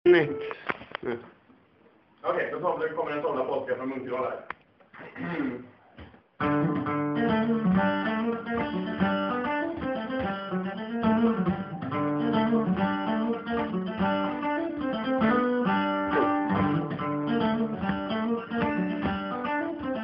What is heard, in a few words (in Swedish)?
Polska Munkedal; Petrus